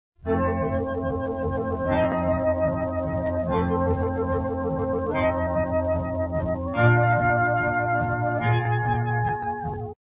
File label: Filtered Excerpt Orchestra; RCA Victor